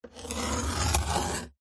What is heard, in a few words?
foley wood scratch with knife blade tip single short